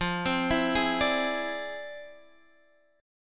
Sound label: F Minor Major 13th, Guitar, Open G